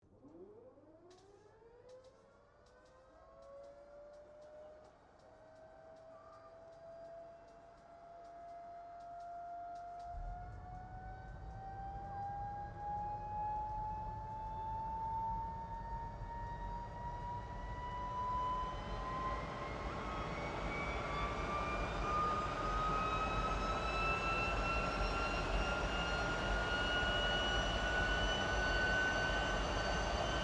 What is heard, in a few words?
AIRCRAFT GLOSTER METEOR F MK8 STARTUP, Gloster Meteor fighter jet; Airplanes; Vehicles